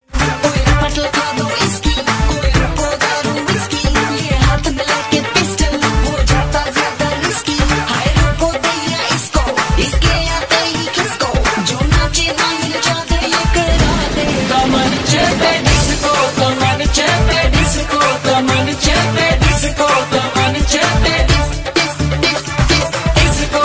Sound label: Disco hindi ringtone